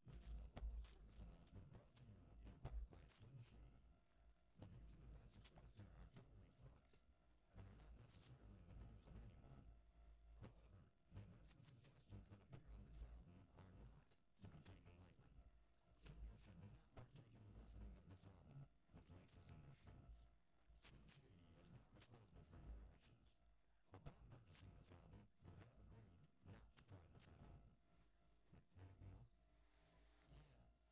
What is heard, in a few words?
Instrumental